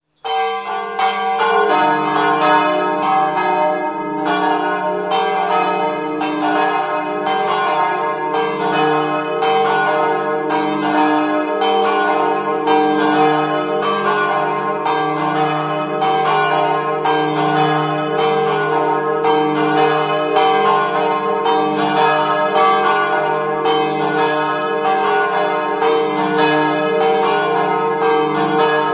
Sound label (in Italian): manzano, campane